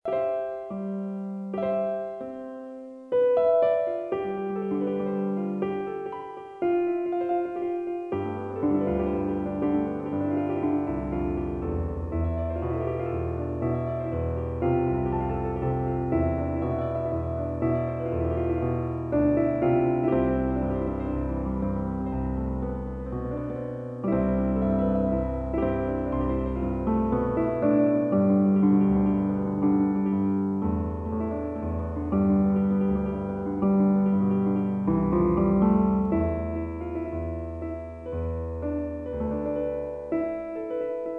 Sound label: Piano